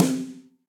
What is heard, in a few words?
Snare Multirod Dry Acoustic; Drum Hits; Standard Hits; Ableton; Factory Packs; Session Drums Studio